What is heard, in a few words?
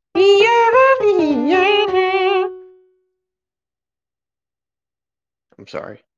eeeyaaahah